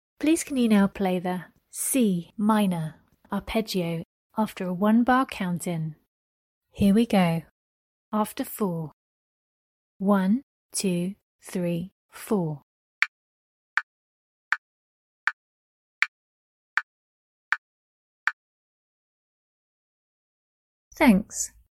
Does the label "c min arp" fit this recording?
Yes